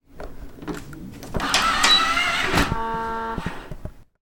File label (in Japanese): benki no doa ga aku; 便器のドアがあく音